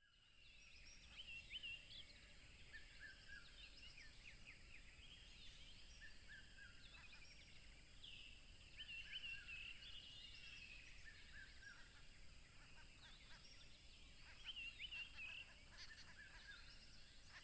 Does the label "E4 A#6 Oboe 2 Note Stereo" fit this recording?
No